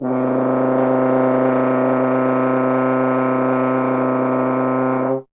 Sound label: F. Horn; Miroslav Vitous; Solo Instruments VOL-2